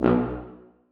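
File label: tuba staccato a#3; Sonatina Symphonic Orchestra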